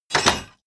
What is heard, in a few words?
medieval menu medium close